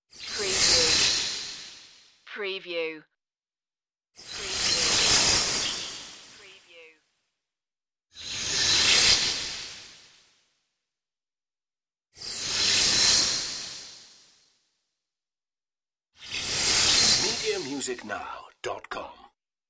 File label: alien swarm flybys